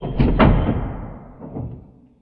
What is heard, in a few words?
DOOR Sci-Fi Heavy Close Lock Reverb; mono; Universal Sound FX; DOORS & GATES